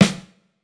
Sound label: japanese pop snare